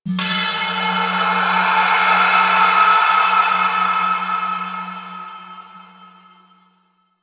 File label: Breathy Bell; Ambience